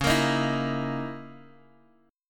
C sharp Minor 6th Add 9th, Guitar Open G; x,6,8,8,5,x

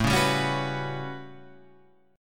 A 13th, Guitar Standard, chord; strummed

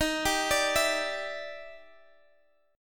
E Minor 7th D sharp; Em7/D#; arpeggiated

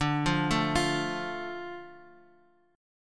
D Minor, Guitar, Half Step Down

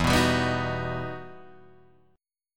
E Minor Major 9th E down Guitar Drop D

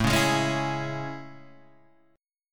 A Major 7th; AM7 Chord {5 4 6 6 5 4} strummed

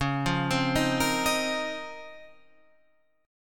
Db Major 13th, Guitar Half Step Down

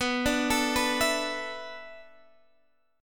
E 7th Suspended 4th

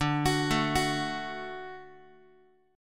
D Major D down Guitar; x,10,9,7,10,x

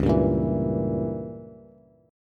Db Diminished 7th Db down Bass; strummed; JGuitar

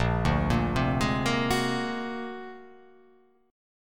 Bb Augmented 9th Bb; Bb+9 arpeggiated; JGuitar

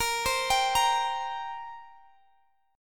Bb 6th Suspended 2nd Bb; Bb6sus2 arpeggiated

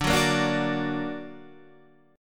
D Augmented, chord

